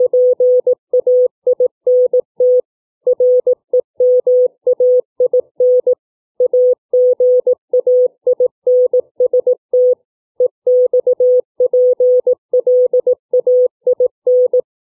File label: letter filtering